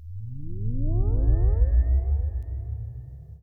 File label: FX Industrial 140